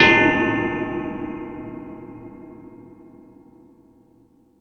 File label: Stadium Rox, Drum Machines, E-MU Pro-Cussion